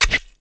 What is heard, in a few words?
KNIFE